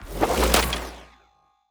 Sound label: Arrow Barrage Charge, Combat